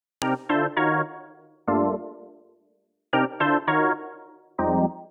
Fm Organ C Minor 165 bpm; MIDI Clips; Tonal; Synth; Ableton; Skitter and Step

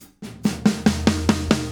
140 Groovy Fast Drums; Pop Rock

